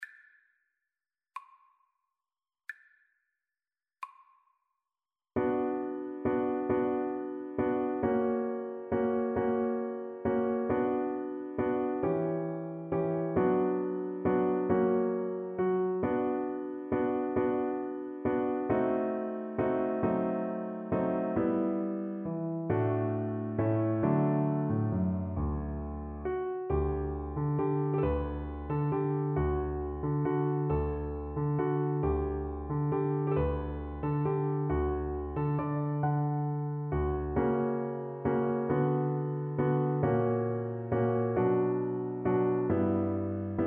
farrenc op5 no2, Viola

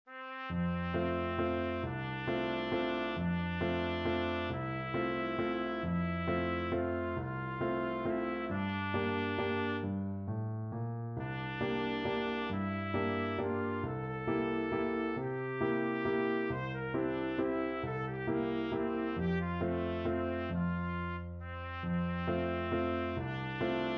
trumpet

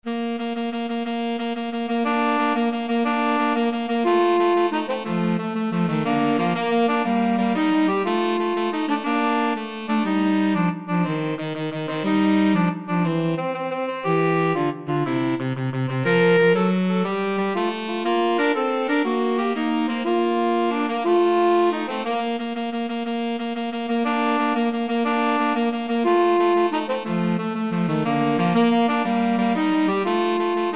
2 tenor sax; tenor sax duet

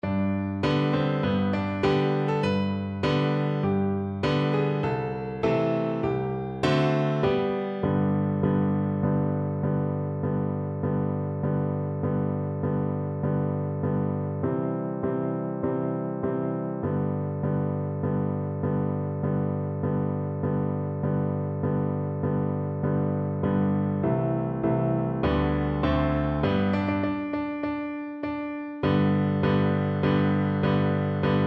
Viola

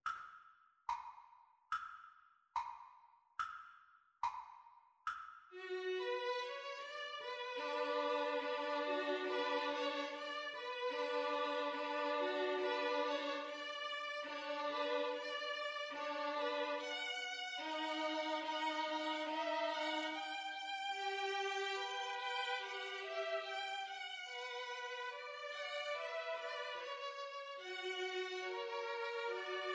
bella ciao, 2 Violins Cello

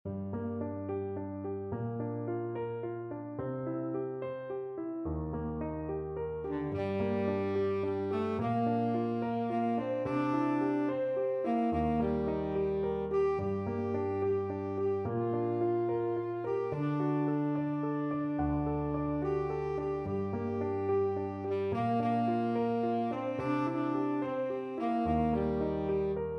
house of the rising sun, tenor saxophone

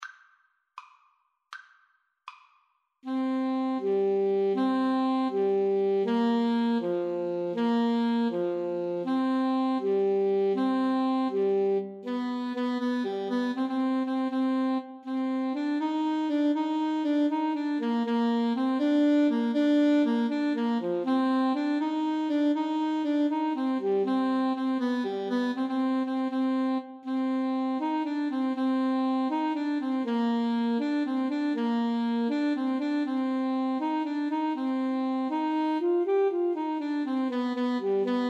brian boru 2 A SAX

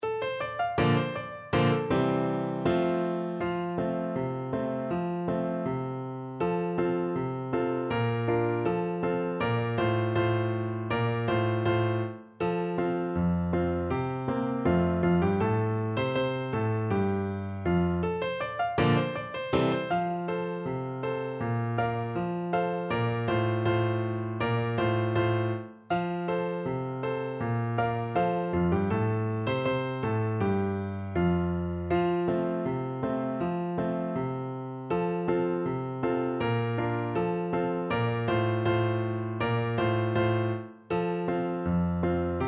Clarinet

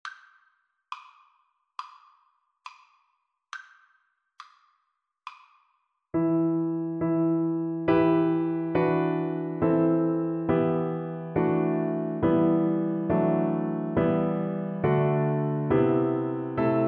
god rest, Viola

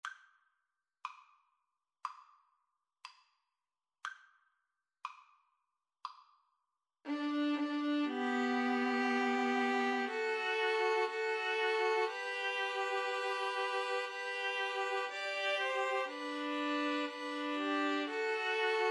zimbabwe national, String trio

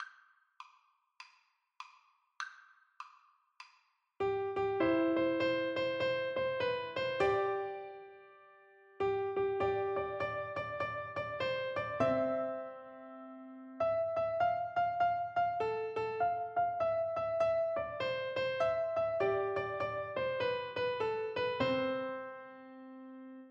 Piano Duet

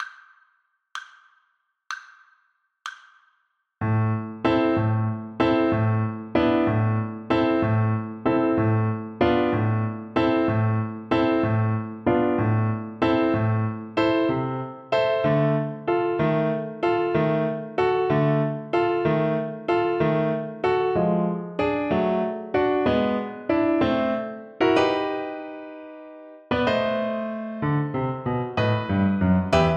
sorcerer apprentice, Oboe

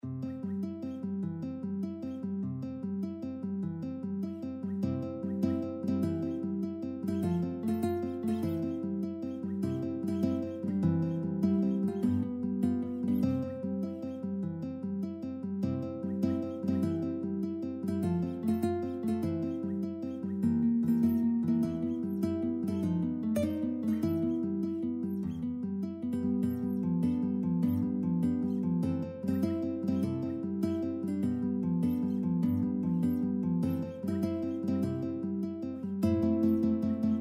o holy night, guitar trio

